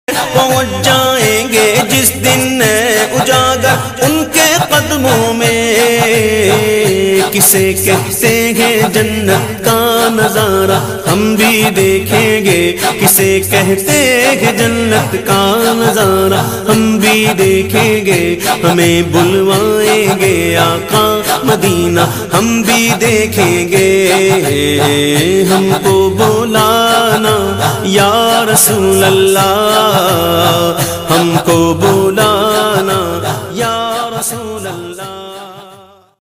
Naat Ringtone; Hum Ko Bulana Ya Rasool Allah; Islamic Ringtones

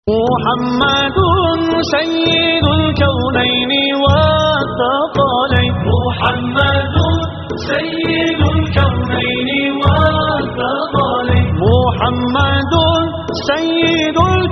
Islamic Ringtones; Mola Ya Salle Wasallam

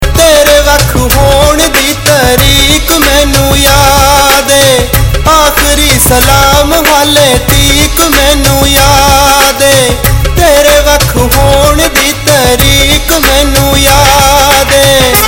Sad Ringtones, Punjabi

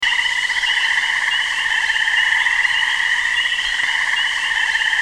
night, weather, Sound Effects; Sound Effects Ringtones (Water Weather)

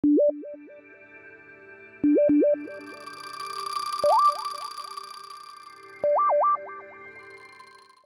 interesting, alarms; OnePlus 5T Stock Ringtones

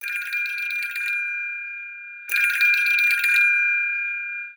Loose change, alarm; Google Pixel 3

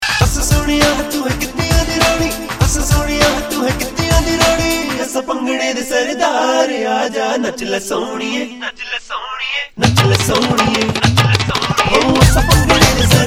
Punjabi Remix Ringtone

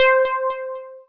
Electron Beam, notifications; Mi Mix 2S Stock Ringtones

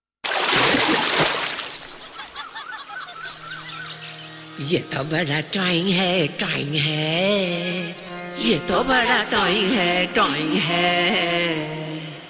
TV Ad Ringtone; Amul Macho

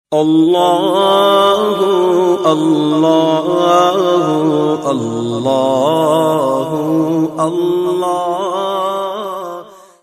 allah hoo allah ringtone, Islamic Ringtones

